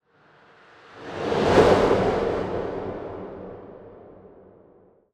Transition Debris, Whooshes; Ghosthack

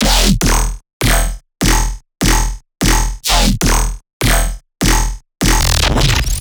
Bass Shot E 150, dubstep; Dubstep Basses; Loops; GhostHack; Advent Calendar 2021

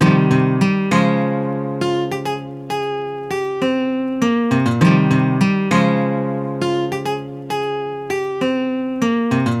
West Coast Melody Loop 100 BPM F Min Guitar; Melodies; Loop Stems; Cymatics; Oracle Sample Pack

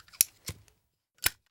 Zippo Lighter Movement Strike; Fairlight Sound Lib

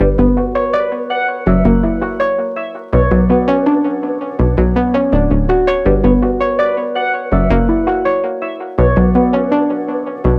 164 BPM B Min, MoogOne, analog hip hop melodies; Horoscopes

